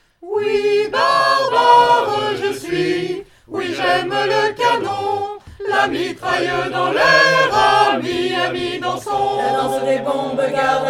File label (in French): chorale